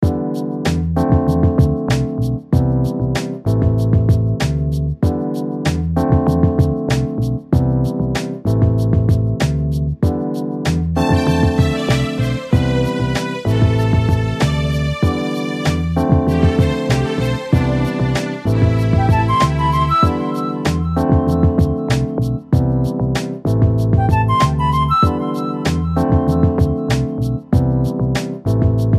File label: Vibraphone Beat